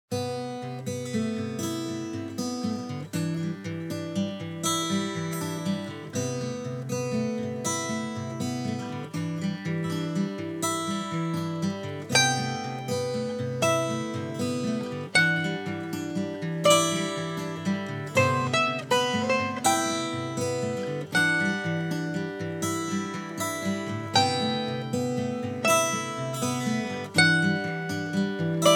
Acoustic; Nightsky; Murky Depths

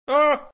ow

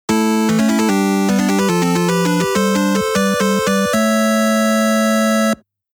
Victory!; Natsume

